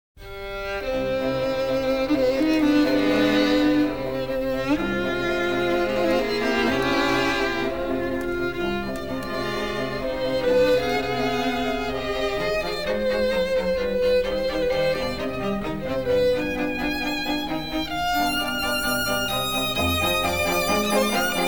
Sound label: strings; beethoven; masteringbox 9db